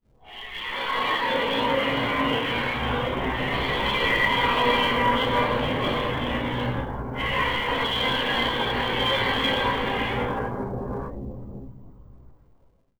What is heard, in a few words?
ambient Voices; XDK4627 BIOS and XDK Launcher and Dashboard(for Retail); ogxbox